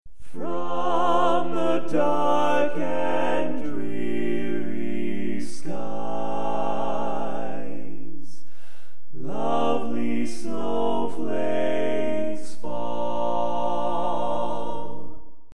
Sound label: Bass, Classic Tags